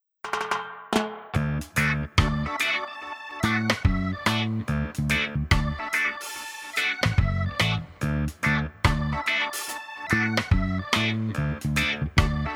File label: backing track